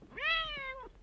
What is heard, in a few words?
Cat moan